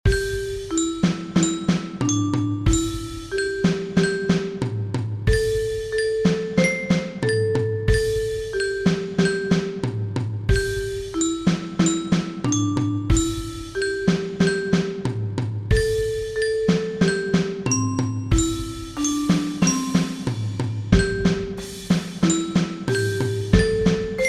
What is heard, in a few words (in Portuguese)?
Toque